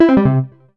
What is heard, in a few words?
signout; sketcher; jotuntech